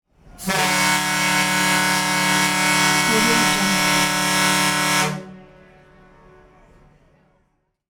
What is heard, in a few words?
ferry horn stereo; ss badger; collanderson